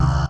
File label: down; kdeedu; ktouch